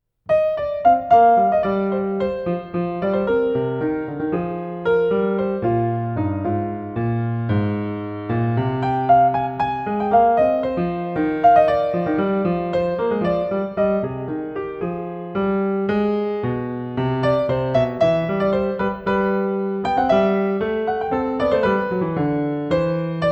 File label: Soprano Saxophone and Piano; Suite for Soprano Saxophone and Piano